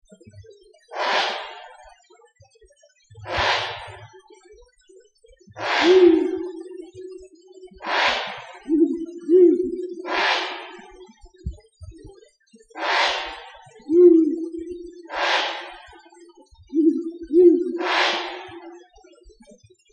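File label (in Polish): puszczyk uralski głos terytorialny samca i głos samicy; ptaki glosy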